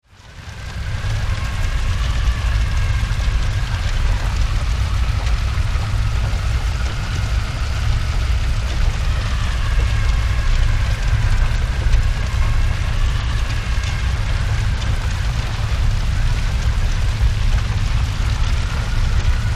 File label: Horror Hell Fire Voices Scream; Scary Soundscape Sound Effects; Scary Soundscapes (Sound Effects:Horror)